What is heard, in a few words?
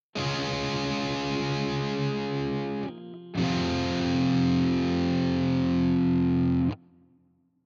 Guitar